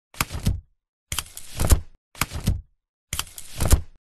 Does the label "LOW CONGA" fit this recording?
No